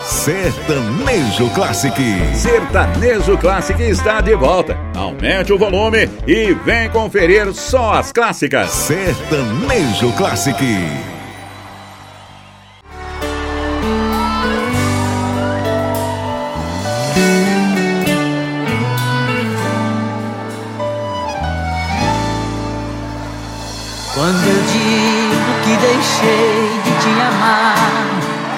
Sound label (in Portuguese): Sertanejo Classic